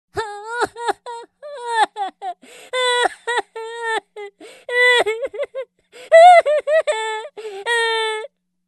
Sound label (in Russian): Звук плача человека из сказки